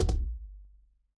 retro game hit

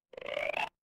animal frog

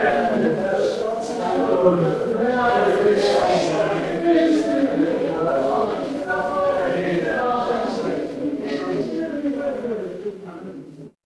crowd murmur

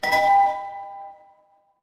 door doorbell

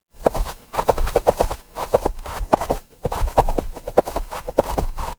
footsteps snow

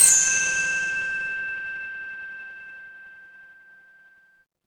instrument chime bar

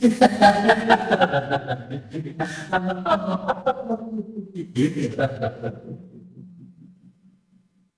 crowd laughter

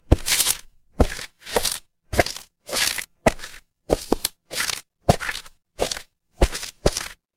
footsteps concrete

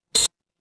ui beep

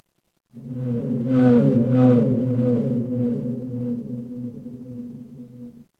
crowd gasp

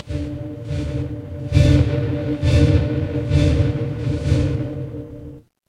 mechanical machine

